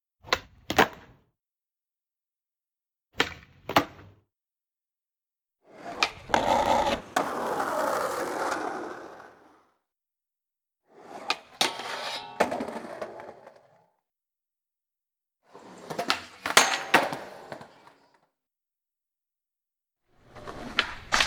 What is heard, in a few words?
Skateboarding Tricks